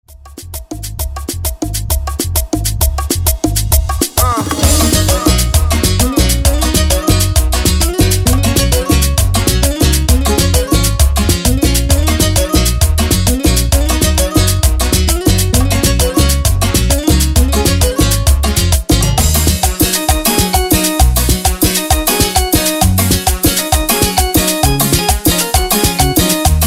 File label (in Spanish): Bombazo Romantico Intro Intrumental; Jexer prod